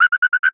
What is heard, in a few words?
Animal Frog Pinewood Tree Frog Warning Call; animals; AdobeCC